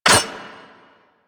Auto Crossbow Mech; Warframe; Tenno

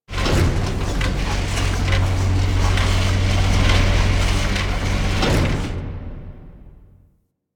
Misc Machines, Asteroid; Ambience; Warframe; Grineer Asteroid

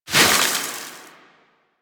Sword Elemental Ice Whoosh; Warframe; Teshin; Weapons

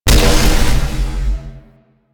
Void Dragon Body Ring Impact; Enemies; Abilities; Warframe; Duviri

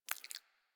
foley mouth move; Enemies; Warframe; Catbrow

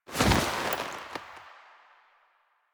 Foley; Finisher; Warframe; Kuva Lich; Finishers

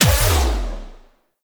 Melee Heavy Attack Lift Impact; Warframe; Weapons; Whooshes; Heavy Attacks

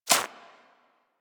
Bird Of Prey Step Dirt; Warframe; Footsteps

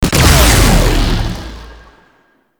Infested Shotgun Alt Fire Shot; Weapons

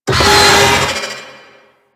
Shipyards Robot Malfunction; Warframe; Grineer Shipyards

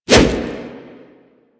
Wolf Swipe; Animals; Warframe; Quests; Duviri

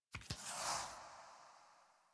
UI Collectible Tarot Card Slide In; Warframe

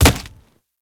Infested Charger Step Default, Footsteps

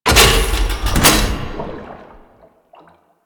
Vial Hazard Restock Tube; Entrati; Hazards

